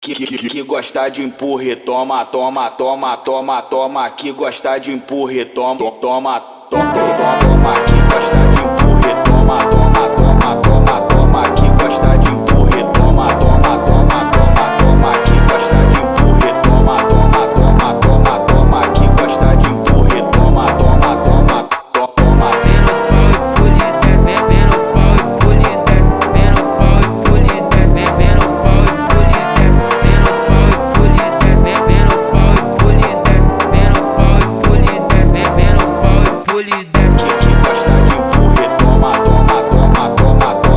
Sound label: phonk